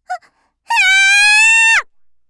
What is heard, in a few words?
kyaa; gaya